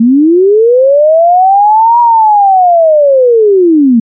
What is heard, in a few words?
fm sine siren